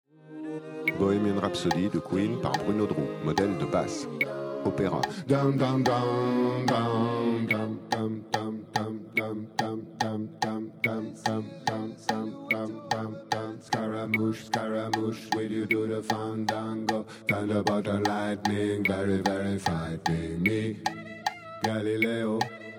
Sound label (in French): Basse Opera